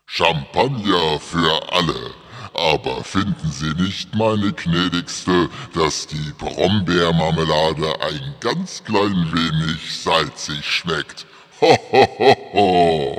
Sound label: Yamaha SPX90 Pitch; Das Monster